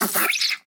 mud wet squirt splat; a dump in the dark

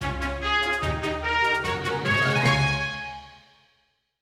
win; Animation Throwdown; kongregate card babies

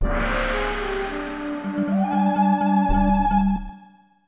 Prehistoric Realm Fanfare; Glover; Nintendo 64

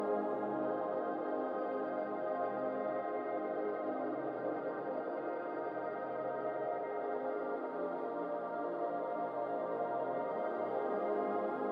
Ambient pause; miside demo windows gamerip 2023

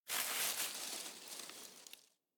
Bushes

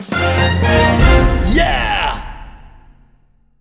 Victory Music Win; gamerip; Hero Defense Haunted Island

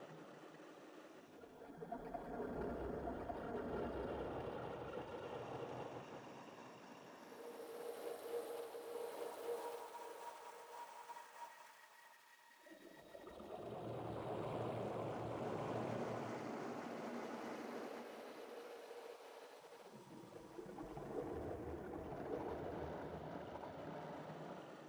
canyon atmos loop; fog; Hollow Knight

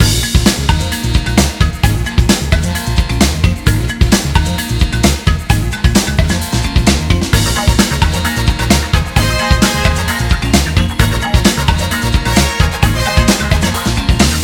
Cave; Banana Kong 2; android ios mobile gamerip

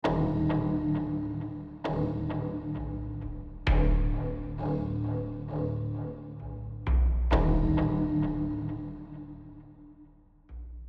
Tension Pulse Low Loop

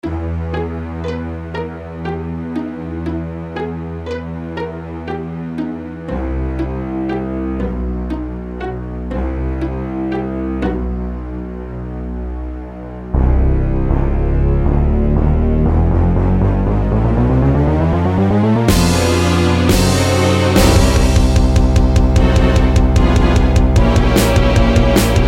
Cinematic; vitamin z ps vita gamerip 2015

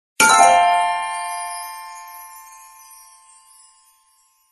Special Coin; super wiloo demake; ps vita ps4 switch windows xbox one gamerip 2019

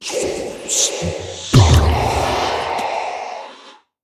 Voodoo Heal; We Are the Dwarves

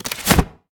City Umbrella Open

